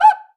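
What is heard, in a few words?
TR-909 MUTE CUICA; Drum Mashines; TR-909 DRUMS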